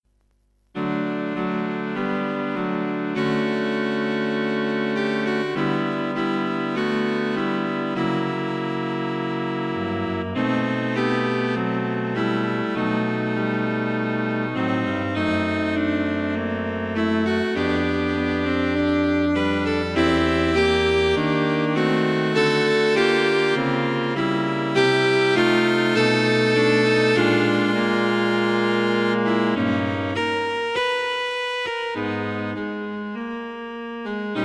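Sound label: String Quartet; Cello Quartet; Four Cellos. A good show tune for concert performance.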